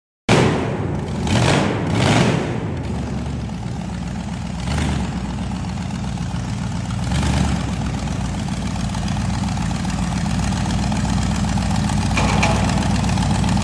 Car Showroom; نمایشگاه ماشین

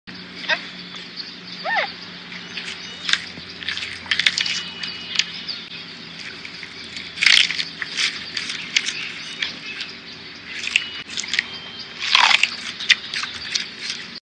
Panda eating bamboo

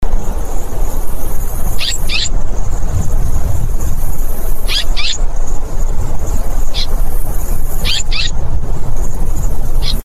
small five toed jerboa

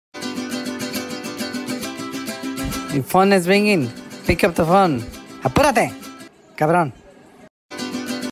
Mexican ringtone

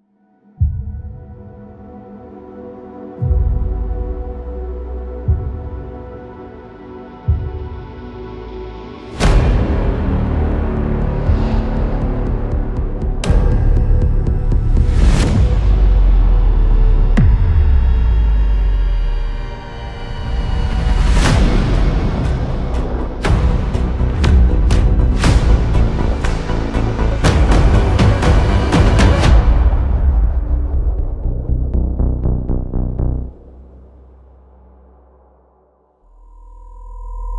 hit for teaser; ضربه برای تیزر

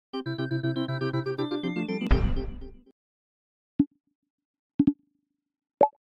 Children's game

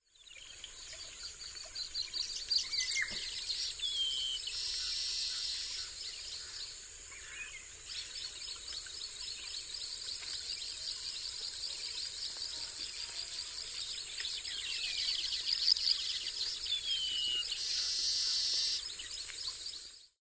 Baya weaver

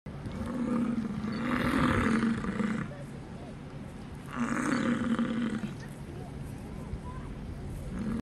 European bison; ویسنت

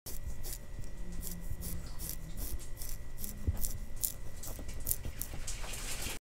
shaving face

